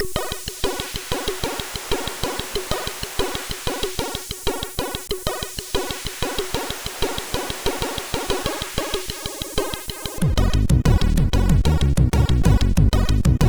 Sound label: Bassliner, MOS6581R4